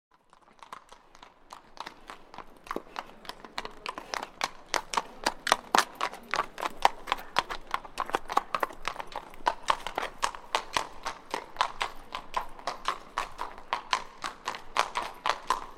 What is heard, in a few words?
Single Horse Walks By On Cobbl; Historical; Hollywood Edge Historical Series; War Horses Armies & Weapons